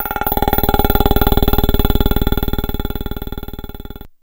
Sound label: Video Game Transiti